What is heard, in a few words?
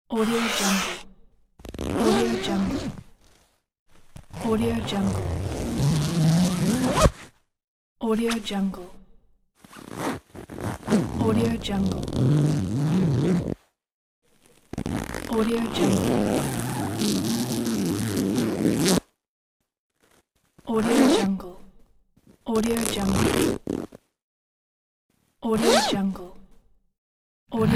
zipper pack; soundsation